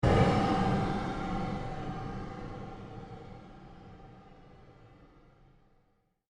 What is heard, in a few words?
pulse, Sound effects created by manipulating other sounds; Rise And Dive; Rises and Dives